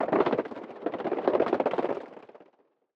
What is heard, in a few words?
Designed Ice HIT Texture, flap filtered movement; Gregor Quendel